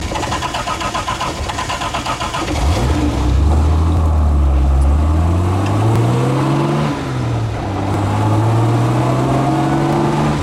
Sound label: Jaguar Series 1